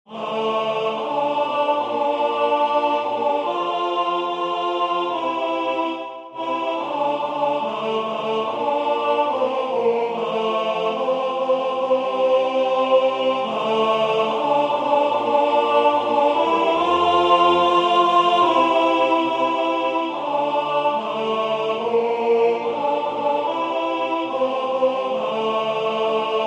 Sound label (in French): Ne crains pas alto